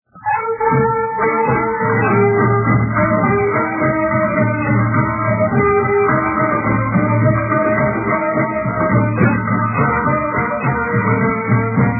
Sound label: music